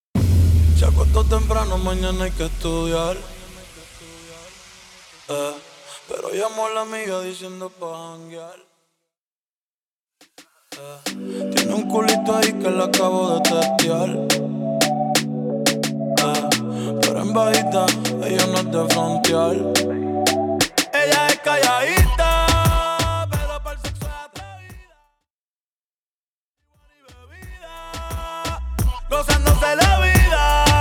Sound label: Acapella Intro 88 BPM